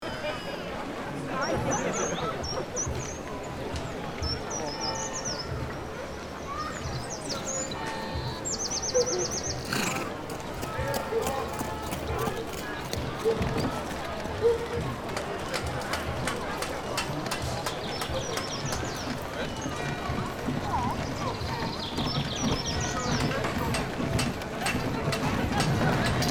Old medieval city ambience within the walls people voices